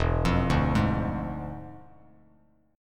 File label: E 7th Flat 9th, Bass Standard, E7b9 chord; arpeggiated